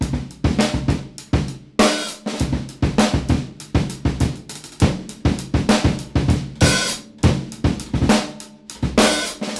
beat 100, acoustic

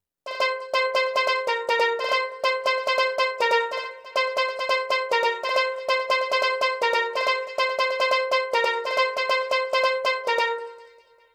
perc 140

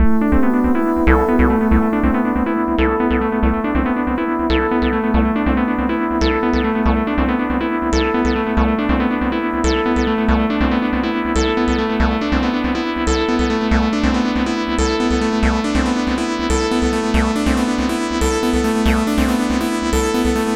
acid 140, synth loops

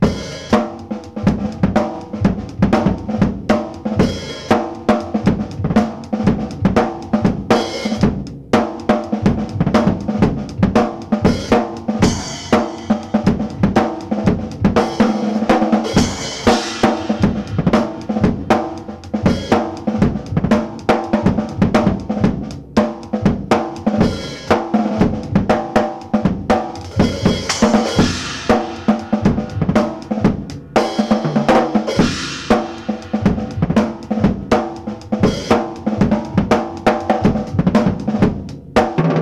beat complex funk loop 120, acoustic

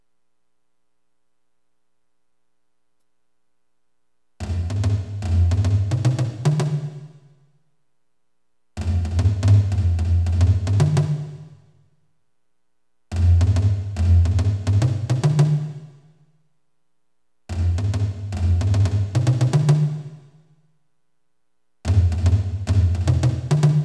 tomtom pattern 110

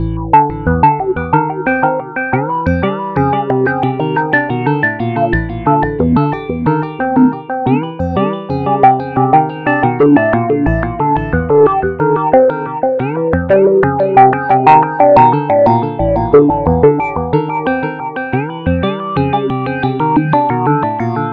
acid wall 90

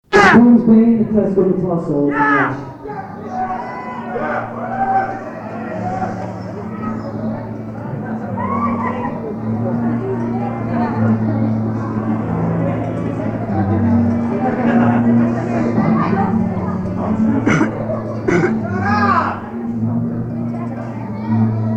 Acoustic Solo; Bruce

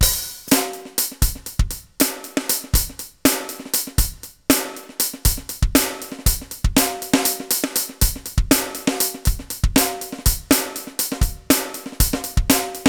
high sync beat 120

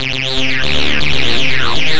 acid 120

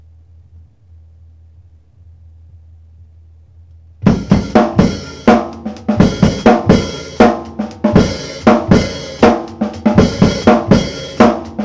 beat 120, acoustic

